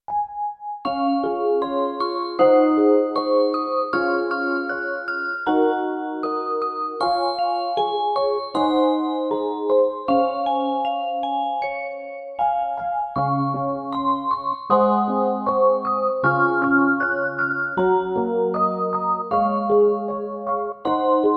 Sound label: Big Old Clock, musicbox